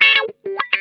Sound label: WAH, Funk Guitar; Zero G - Funk Guitar